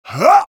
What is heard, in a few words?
heavy taunt exert; vo; taunts; tf2